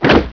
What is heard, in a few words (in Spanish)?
Puerta Cierra; Westerner